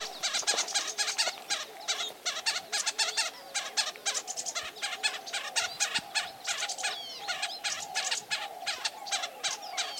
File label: Guiding call, honeyguiding